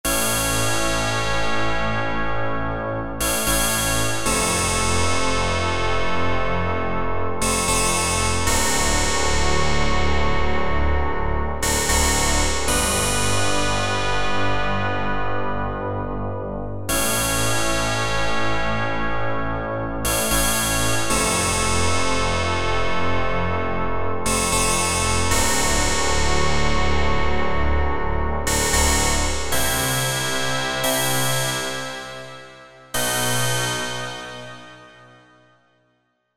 SYNTH TEXTURES Fifths; Fontelina Fifths; Apple GarageBand 2

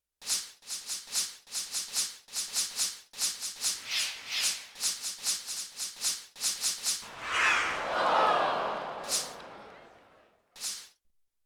Shaker; Sound FX 1

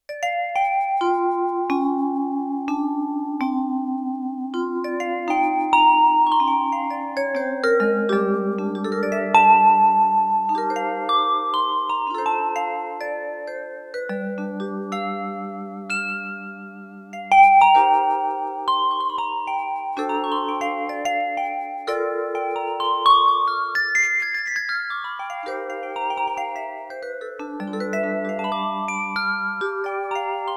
Synth-Vibe, Yamaha TX16W

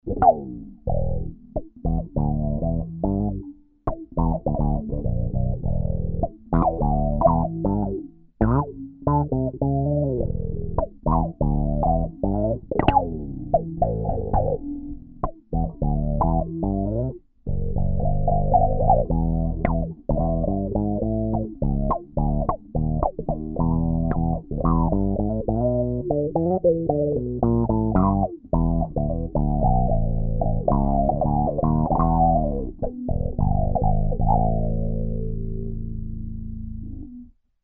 TAURUS, Zoom 506 II

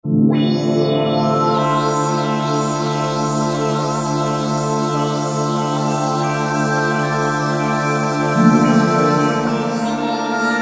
Creation, Yamaha QY70 XG Voice; XG Voice examples

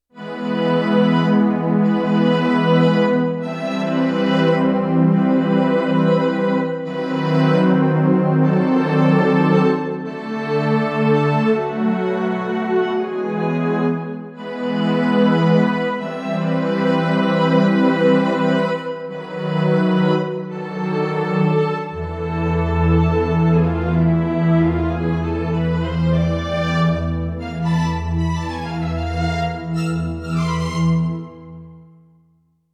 Organic Strings, Roland VC-1

